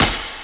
clap sample d sharp key, hand clap tuned to the D# note. Loudest frequency: 2999Hz; single hits one shots